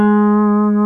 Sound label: PEDAL STEEL